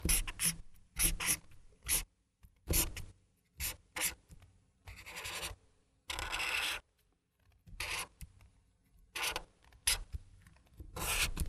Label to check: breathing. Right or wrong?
Wrong